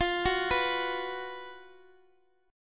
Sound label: B 5th F, chord; B5/F Chord {X X X 10 7 7} arpeggiated